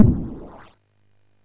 SFX, MOS6581; Narco Police

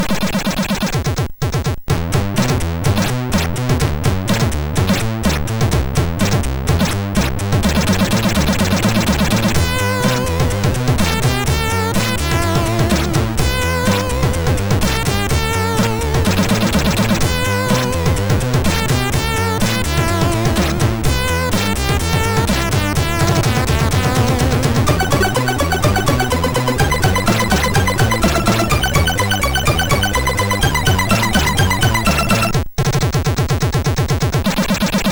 tune, MOS6581R2

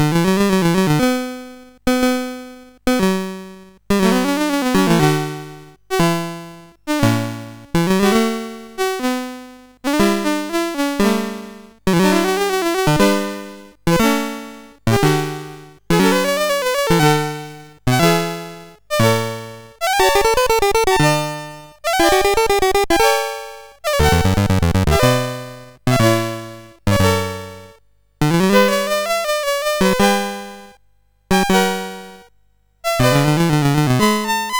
Fugue No 1, CSG8580R5

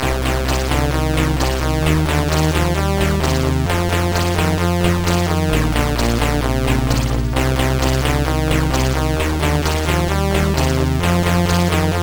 Dingdong, MOS6581R4

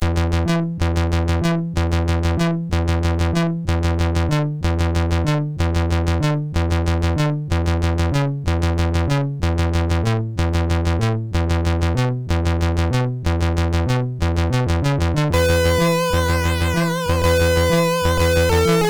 Bluesbird, sid MOS6581R4

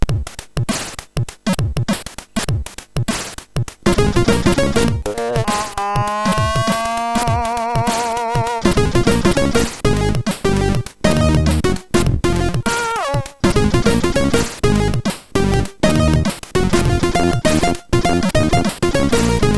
Koke Stek, MOS6581R4; hvsc

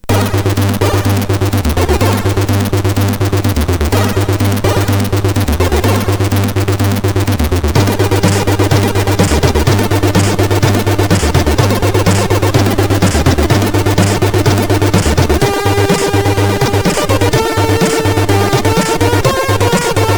Trebbler, sid CSG8580R5

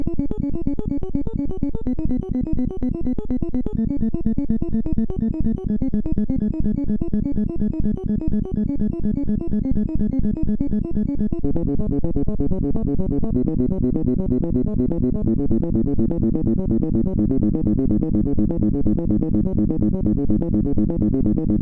Classical 2, MOS6581; MOS6581R4

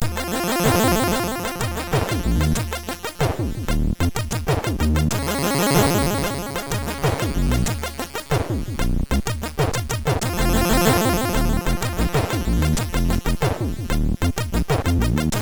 Fat Bass, MOS6581R4

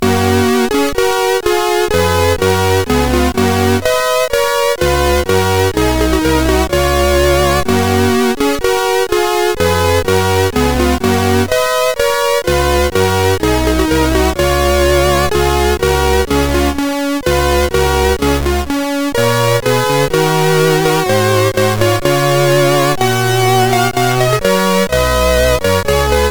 Hymne, sid MOS6581R3

